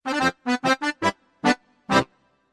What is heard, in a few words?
Music Logo Accordia, accordian